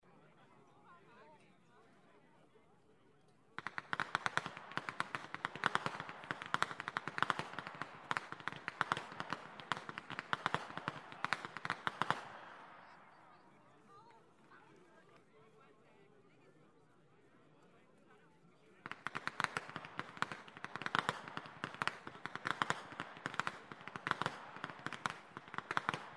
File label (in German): schnalzen, flachgau; ANTHERING III